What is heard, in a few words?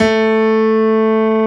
PIANO A2; Analogon